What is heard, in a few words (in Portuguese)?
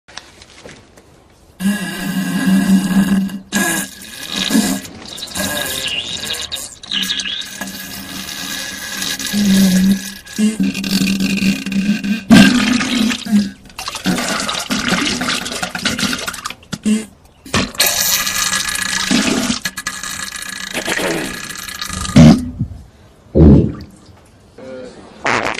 Som de diarreia com peido